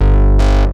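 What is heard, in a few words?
sample and hold G1; Best Service Dream Experience; S+HOLD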